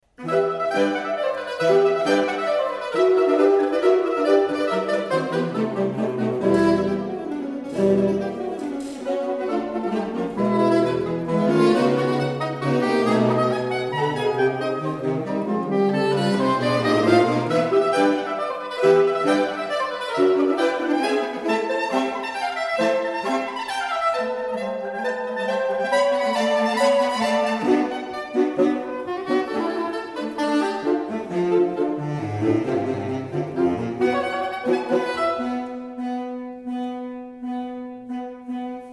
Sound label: 5 Sx; D Anto A